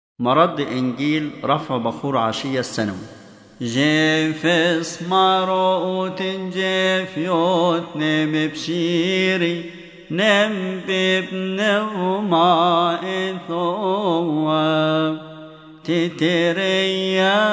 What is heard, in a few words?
Vespers Gospel Response